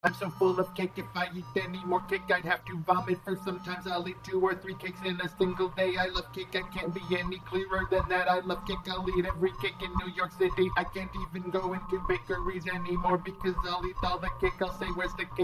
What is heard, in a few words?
Cake Cadence; cake covers